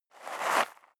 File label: step leather shoes gravel light slide short; schritte